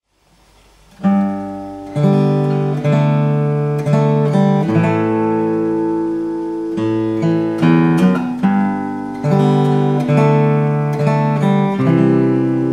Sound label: fingerstyle, guitar